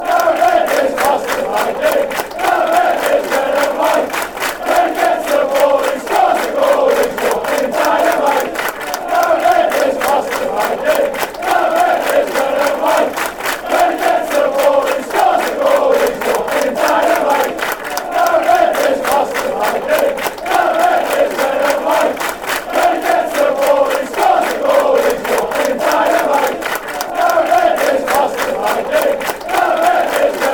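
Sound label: football chants; darren bent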